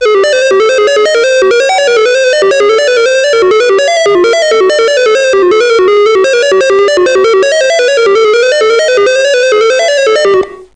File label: bleeps